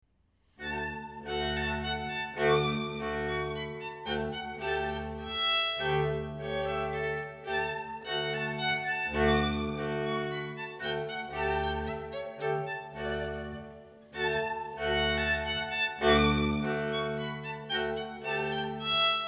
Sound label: Ländler for 2 Violins And Bass, in D Major